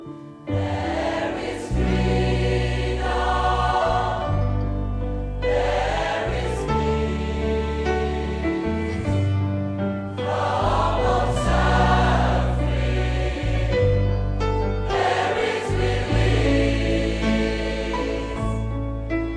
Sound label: Your Child, Gospel Choir; Rueil Malmaison; FRANCE